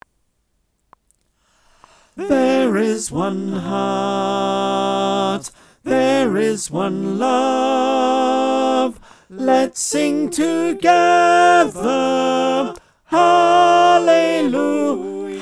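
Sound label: tenor, choir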